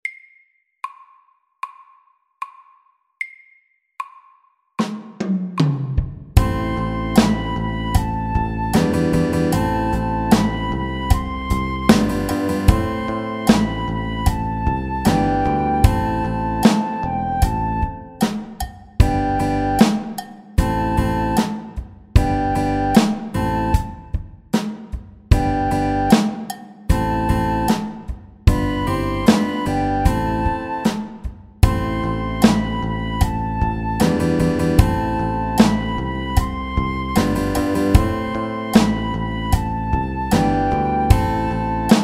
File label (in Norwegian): Crazy beat SAKTE